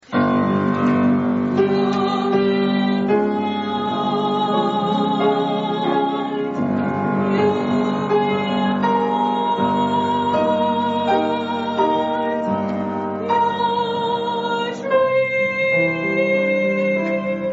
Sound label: soprano